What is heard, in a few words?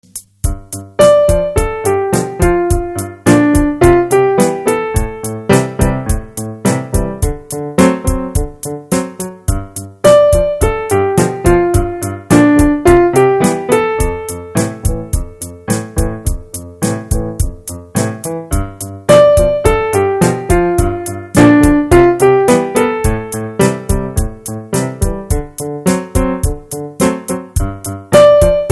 piano